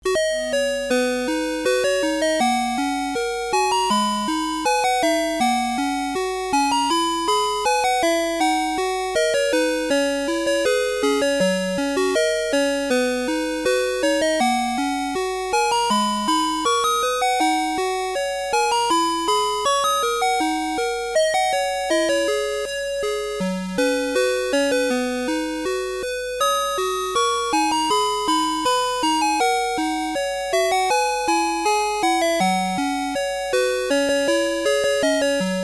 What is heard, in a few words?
8 Chord A